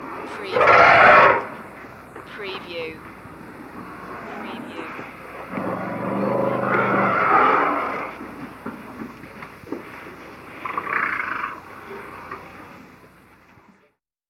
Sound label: Elephant Watering Hole